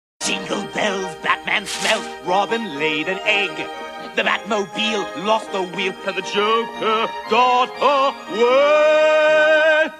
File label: jingle bells